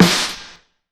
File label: snappy snare drum sound f key